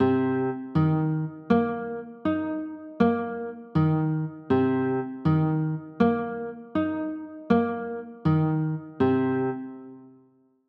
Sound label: c m7, chords